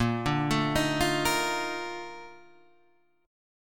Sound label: A sharp Major 11th A sharp